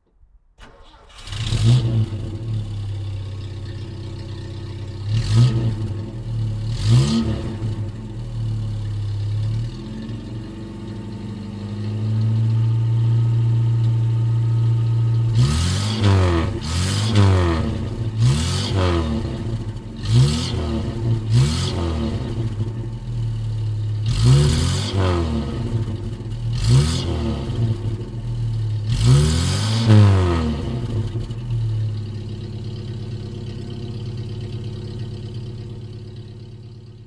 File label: Opel Olympia Rekord; Olympia '56